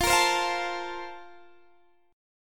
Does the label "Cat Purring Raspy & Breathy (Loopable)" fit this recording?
No